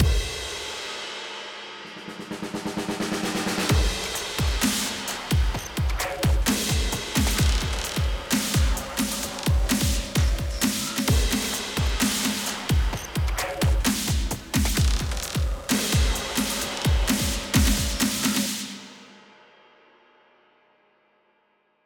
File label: ELECTRO ROCK DRUMS; SABI